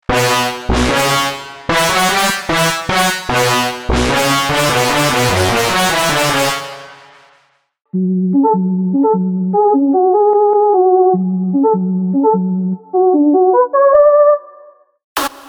Leads Trap; Melody Demo; Unison MIDI Wizard Plug-In

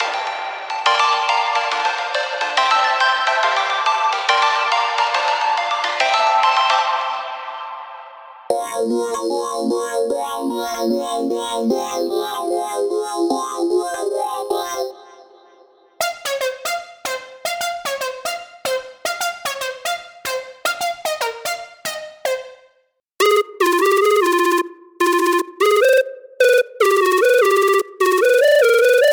Dubstep

